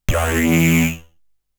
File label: Android